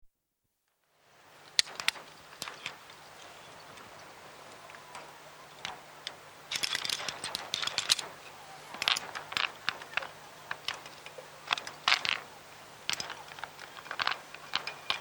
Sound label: Climbing, ascending rock face from climbers perspective, with chinks of equipment on rock, etc; Miscellaneous Sport Sound Effects; BBC